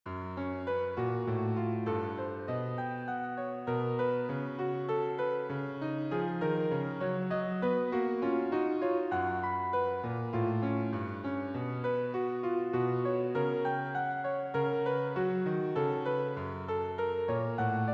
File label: waltz